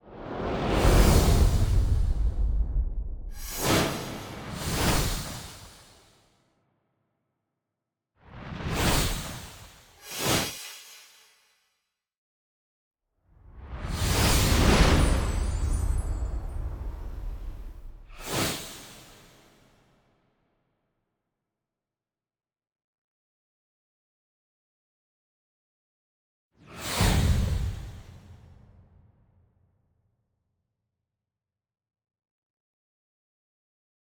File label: SFX; Unsung Hollywood; fall sizzle